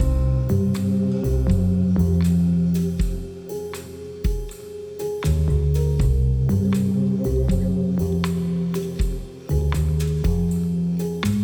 Night Cafe Vibes, Loops

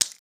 rock falling

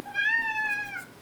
Cat Meows - Food Time; Micro Pack

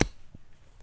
Hammer Strike, Melon; Micro Pack; CaptSubtle

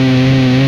Engine Loop, retro arcade vehicles

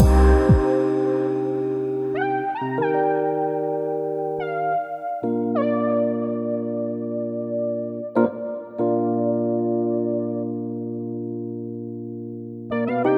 Cooldown Solo; BB 2HTC Samples Vol 4 Addendum; Solos

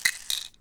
Prescription Roll, Pill Bottles

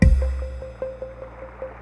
Blip Kick, Drums; BB 2HTC Samples Vol 4

